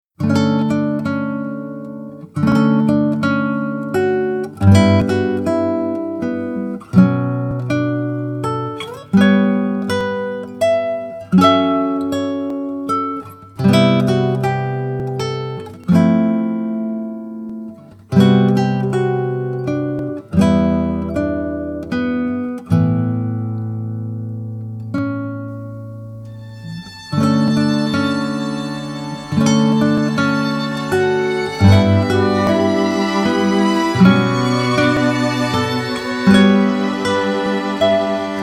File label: Guitar